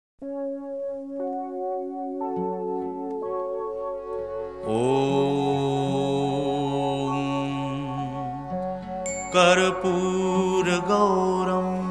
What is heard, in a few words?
mantra